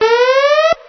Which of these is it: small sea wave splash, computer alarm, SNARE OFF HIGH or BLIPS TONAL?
computer alarm